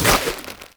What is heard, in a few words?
Infested Fishing Spear Charge; Weapons